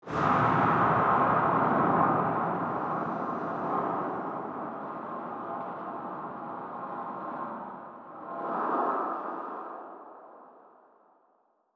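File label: mega shipyard metal ambience; Stellaris; federations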